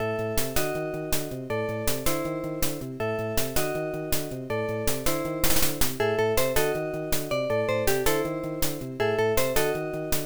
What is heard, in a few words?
Main Bgm; Wacky Gator